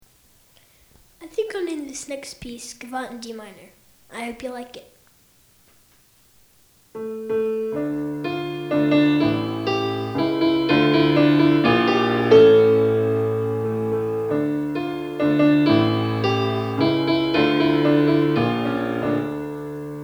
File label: Gavotte in g minor